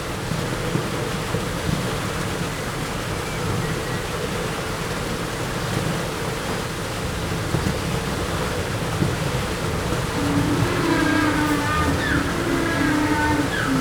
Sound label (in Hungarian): felnőtt állatok inkább vizes, pápaszemes pingvin; jászberényi zoo